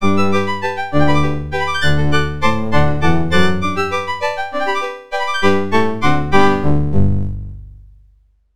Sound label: Flower Calliope; Putt-Putt Joins the Parade; 3DO, Android, iOS, macOS, MS-DOS, Windows